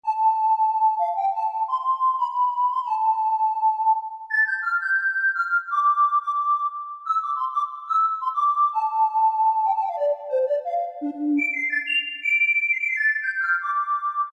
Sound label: Wisul, Yamaha TG33